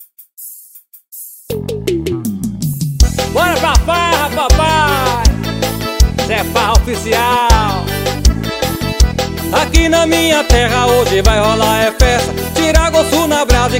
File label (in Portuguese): tome forró